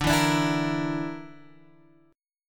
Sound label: D Suspended 2nd Sharp 5th D, Guitar, Open G